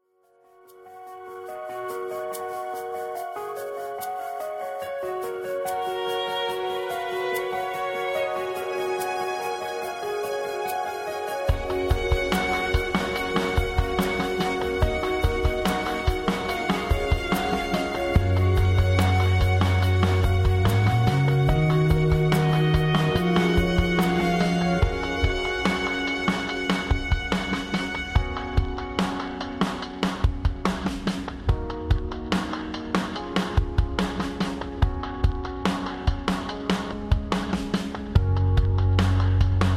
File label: rhythm